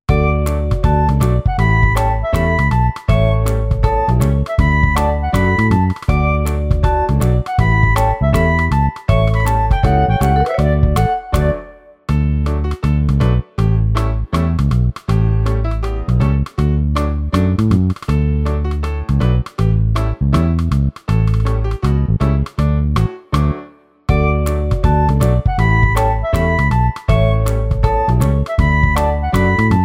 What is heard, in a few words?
Karaoke